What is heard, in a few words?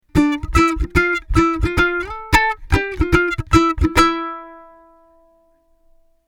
octave, Ukulele; octave notes simultaneously